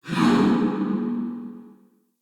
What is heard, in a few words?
Ghost Soldier Attack, Vocals; Enemy; Wandering Ghost Soldier